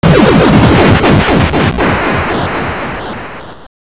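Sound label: boss explosion; svencontra2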